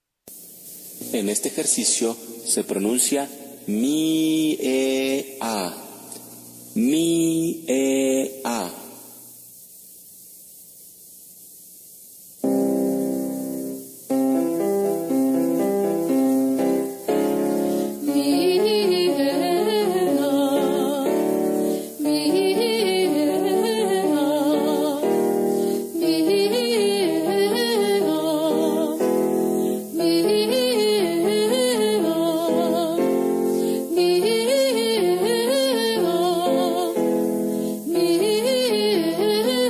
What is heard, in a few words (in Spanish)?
Vocalizacion mi e a